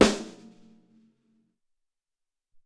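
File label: Long Snare, Alesis SR-18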